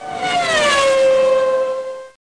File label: Fast by, Bike; CASTROL